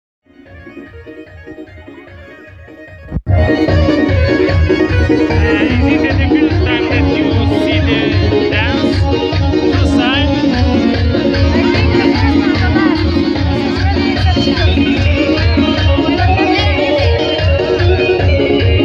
mariage kurde musique

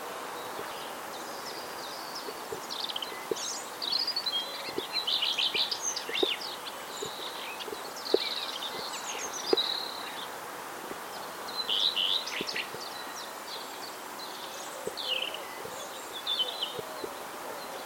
rougegorge rougequeue

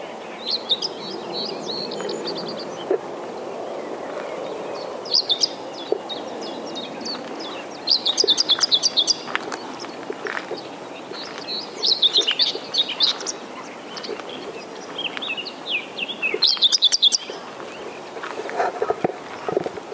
fauvette grisette

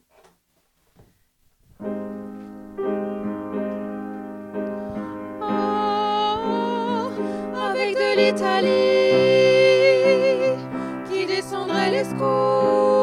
couplet femmes

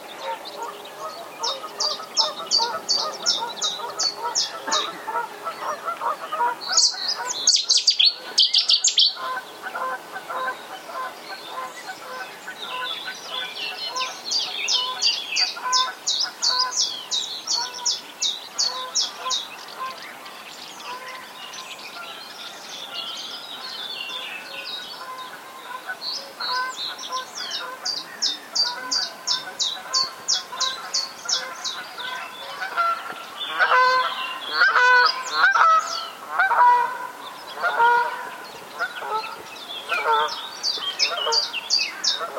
pouillot veloce bouscarle

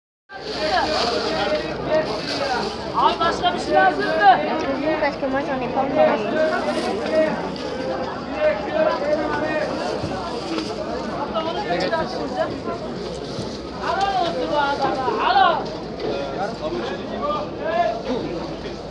Ürgüp marché

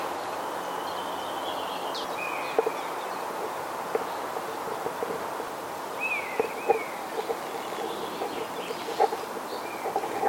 buse variable etang coq

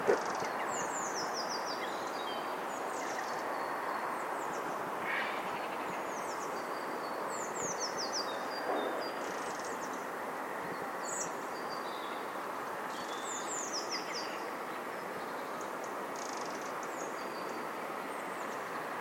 troglodyte mignon mitraille

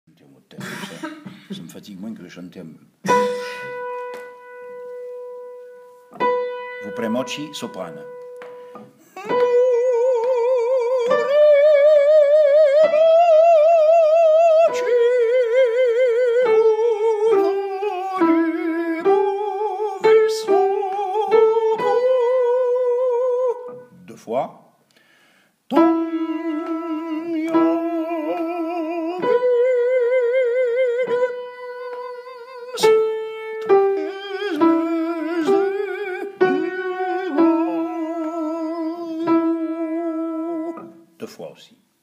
soprano

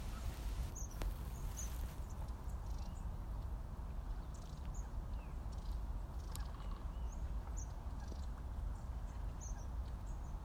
mesanges longue queue et autres mesanges

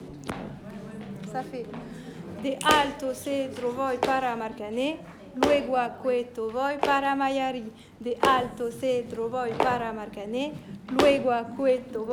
rythme

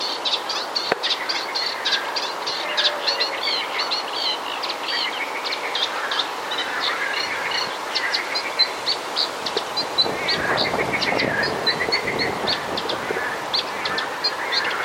rousserolle effarvatte

